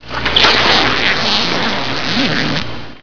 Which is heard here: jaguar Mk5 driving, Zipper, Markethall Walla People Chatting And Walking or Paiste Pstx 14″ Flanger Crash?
Zipper